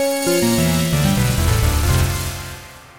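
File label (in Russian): жуткие синтезаторные грувы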